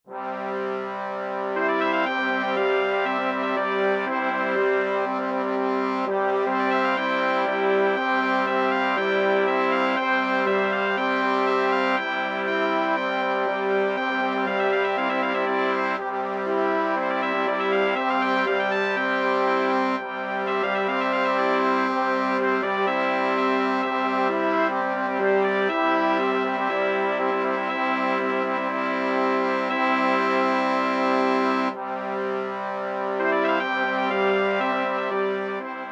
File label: Brass Quintet; L'Orfeo